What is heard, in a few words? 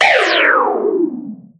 Sound FX Tape Lazer